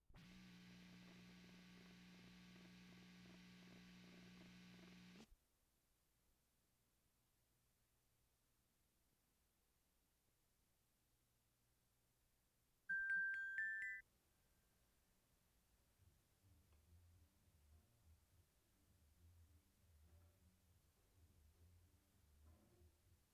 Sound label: XYLOPHONE